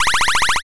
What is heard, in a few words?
fast forward